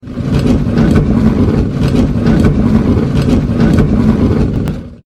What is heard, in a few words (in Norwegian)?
Move Bokhylla